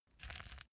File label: small pepper grinder glass ringing soft